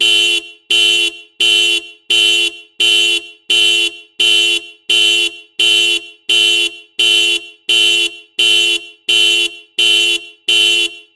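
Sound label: car alarm, 盗難防止音 近; 盗難防止音１近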